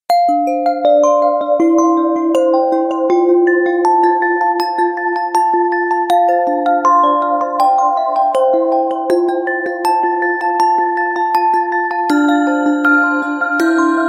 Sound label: Magical Bells